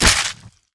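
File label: attack bite medium, creature; Skyrim Special Edition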